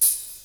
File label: HIHAT WORK O, DRUM FILL; Crypton - DrumBase5000